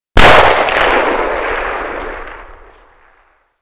Inf Weapons; Red Orchestra 41-45

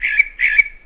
birds karelia; World of Tanks Blitz

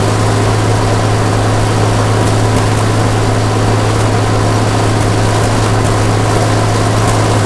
m1 engine rpm 99 load, tanks; engines; War Thunder

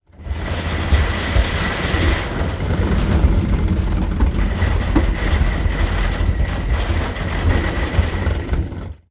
engine broken, tanks; War Thunder

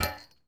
ui drop chainlink; Rust

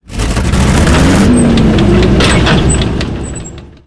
panzer4 engine load, tanks; War Thunder

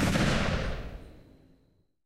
8mm tail close, guns tanks; War Thunder

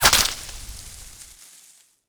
leaf small

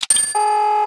lamp; World of Tanks Blitz; GUI battle streamed

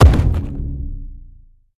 damage; World of Tanks

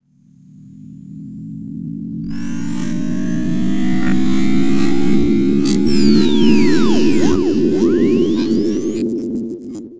falling warning pitch up

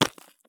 sprint gravel; Escape from Tarkov

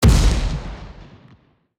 impacts; World of Tanks

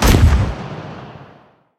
aa machine gun big last shot; guns; War Thunder

